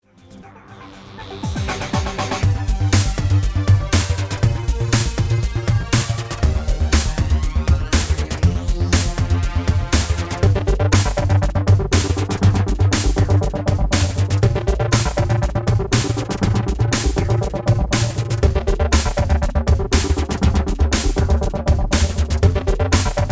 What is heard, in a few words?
Electro